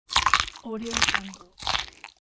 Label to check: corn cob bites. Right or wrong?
Right